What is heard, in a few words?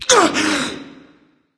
nv breath; smite; voicepack; xbalanque